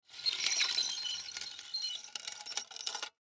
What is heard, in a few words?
Metal Squeaks, Metal Squeaks Device; Metal Squeaks Device Long Slow; Foley; Blastwave